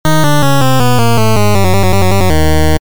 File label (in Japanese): 全滅 レトロ モノラル